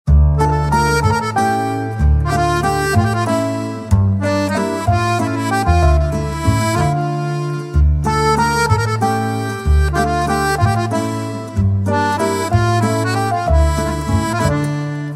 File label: Accordian Music Turkey Ringtone